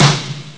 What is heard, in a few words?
Lazer snare; ST-XX Amiga Soundtracker Sample Packs